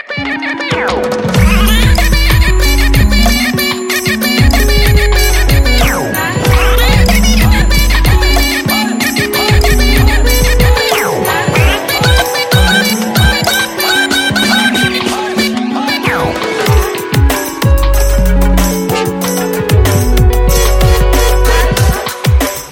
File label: Ringtone; Samsung Galaxy Tab A7 Lite